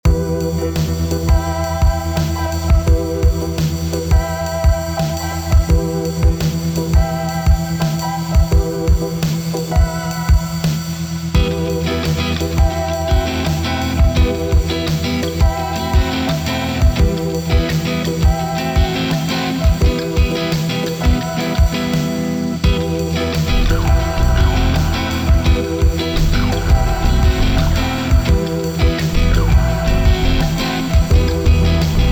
phonk drive full song loud; elektra magika

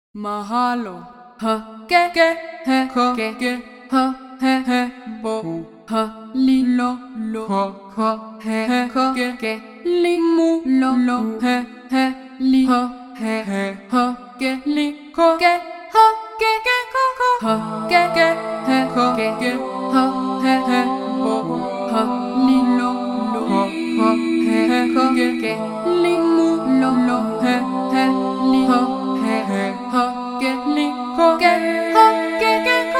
tiki voices; tiki instruments; chiqui audio

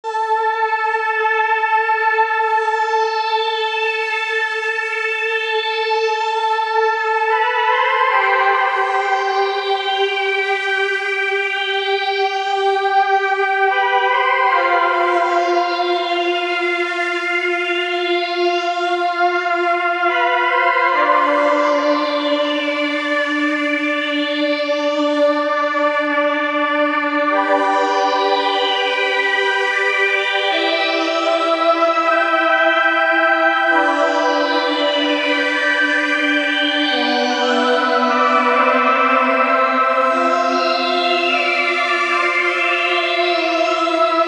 Syn Strings; Zebra

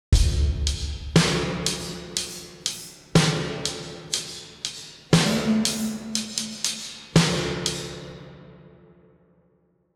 reverse crash drums; anise glide reverb; audio spices

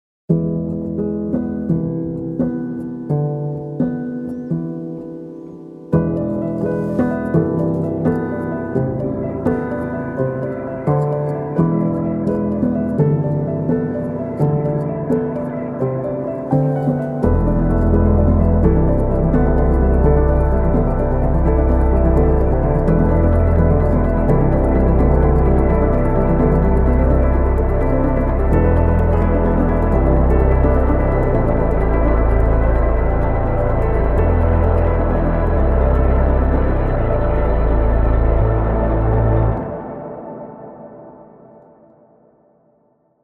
flannel piano; eilean; glencoe audio

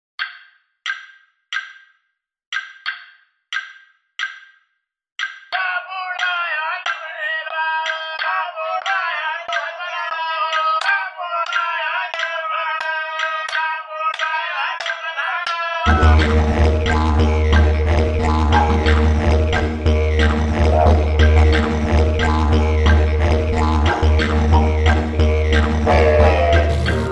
didgeridoo performance; samplesphere

